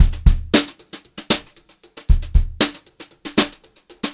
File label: beat, Brushed Drum Loops; USB Soundscan vol.39; AKAI; 90 s Sample CDs; 116 HOTROD